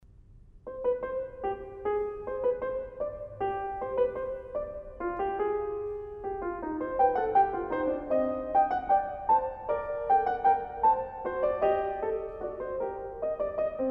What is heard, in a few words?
Bach Fugue in C minor